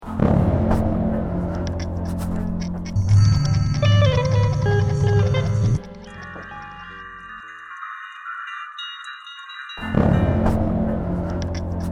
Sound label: Guitar bong